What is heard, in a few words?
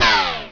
Delete Connection Sound; Widget Workshop; A Mad Scientist's Laboratory (1996); Maxis